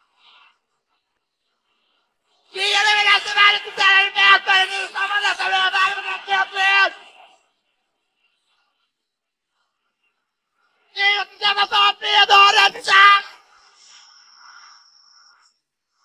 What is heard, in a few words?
vocals